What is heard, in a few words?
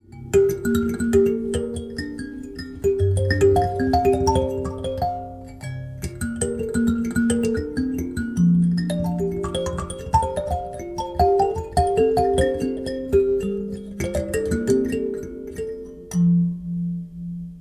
mbira